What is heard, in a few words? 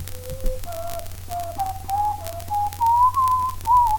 test whistle